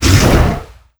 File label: damage, monster; patren